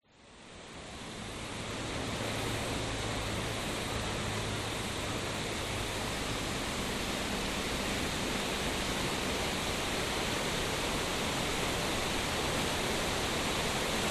forest route birds insects wind trees few people distance summer australia; nature